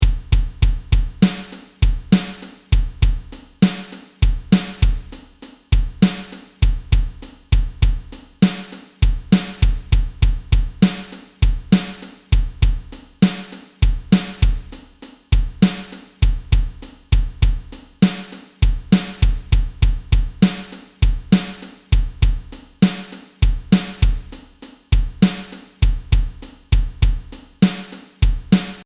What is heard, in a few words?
Break 50bpm